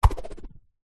foley stick plastic swipe swing through air whip wobble; zapsplat